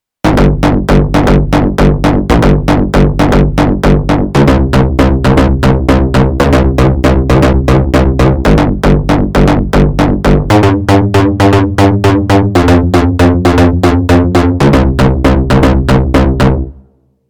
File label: bass